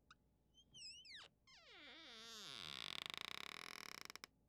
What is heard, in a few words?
Squeaking door